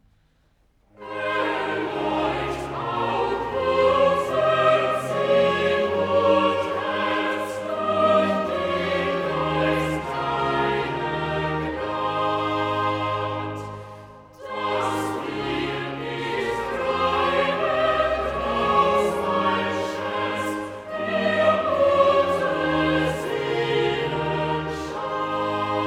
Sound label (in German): Choral, cantatas; Erleucht auch unser Sinn und Herz; Bach; Du Friedefurst, Herr Jesu Christ